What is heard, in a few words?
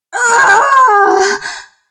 death